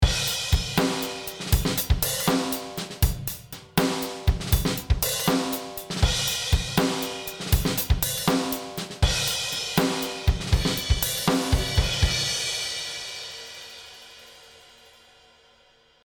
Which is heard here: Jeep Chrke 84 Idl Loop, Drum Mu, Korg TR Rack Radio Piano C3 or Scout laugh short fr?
Drum Mu